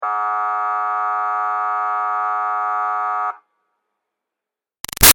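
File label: Siren Police Buzzer Long Medium Far; Emergency Vehicle Sound Effects; BigRoomSound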